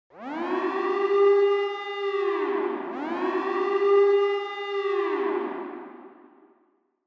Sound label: Warning Siren, Reverb Slow Short